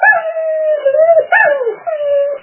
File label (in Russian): sobaka skulit kogda skuchaet